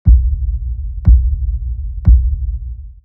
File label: Countdown; out of reach treasure royale 2020 gamerip pc